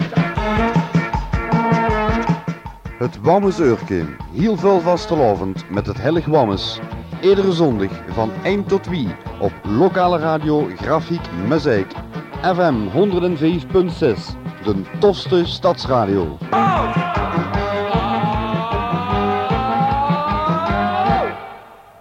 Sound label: Jingle; Wammes Eurke